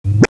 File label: Blip; Princess and the Pea; SoftKey